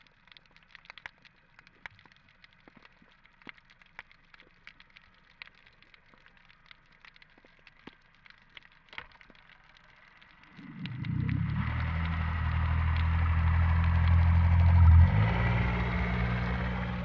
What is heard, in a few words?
Boat Passage, Underwater; Trieste; B-format